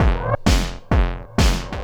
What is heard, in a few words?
techno, DRUMLOOPS